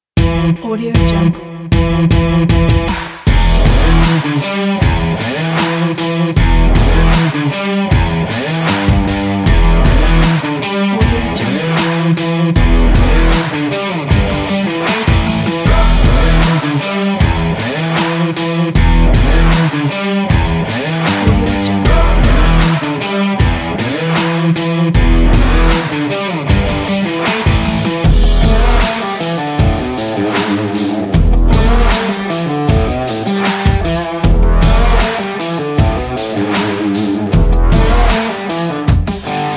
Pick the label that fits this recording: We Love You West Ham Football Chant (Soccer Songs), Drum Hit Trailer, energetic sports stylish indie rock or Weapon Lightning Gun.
energetic sports stylish indie rock